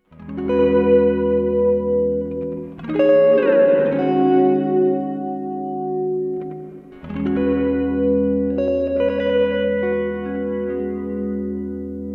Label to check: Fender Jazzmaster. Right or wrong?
Right